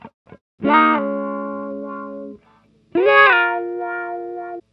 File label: 101 Pop Guitar Wah Db maj, Electric; Pop Rock; Electric 100-160 bpm; Pro Tools Essential Loops; Digidesign